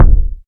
kick drum single hit F key, Royality free bass drum single shot tuned to the F note